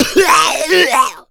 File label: smoker pain, zombie; Left 4 Fortress